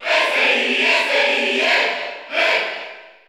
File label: Cheer Spanish; SSBU